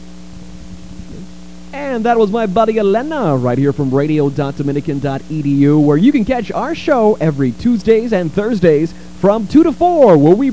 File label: old vocals